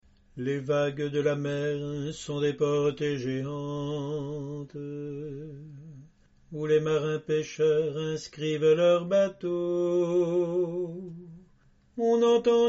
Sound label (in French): la mer toujours la mer alto solo